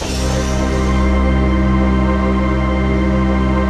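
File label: chord pad, Pads; Best Service; XX Large - Pads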